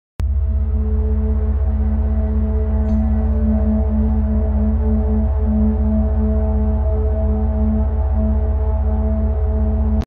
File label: Beta waves